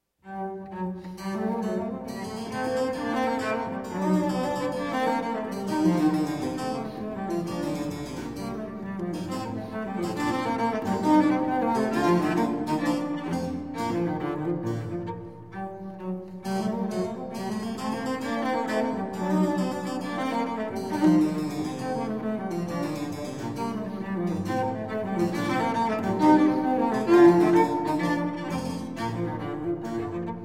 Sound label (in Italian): Sonata In Sol Minor, Allegro, Violoncello e Basso Continuo; Op2 No4; B Marcello; Sinfonie and Sonate del settecento italiano per Violoncello e Basso Continuo